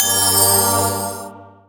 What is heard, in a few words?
Pickup Magic Bells; SFX Kit; Pickups